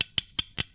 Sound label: Wind Up Sound